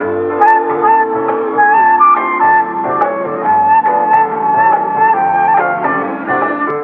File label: flute and voice dee dah dee dah, Loops, Phonky